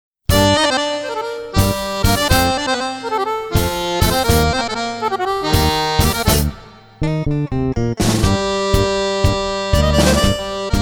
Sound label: Tango Spagnolo